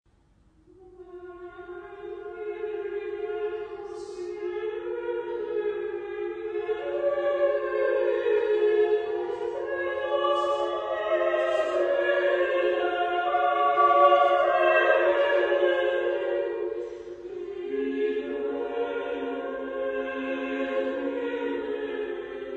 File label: Missa Brevis Benedictus (female), chorus; Penderecki